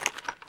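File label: Weapon cowboy reload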